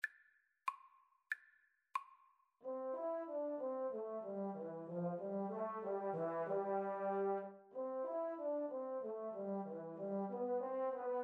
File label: Tuba Duet